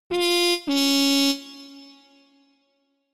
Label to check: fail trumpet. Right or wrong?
Right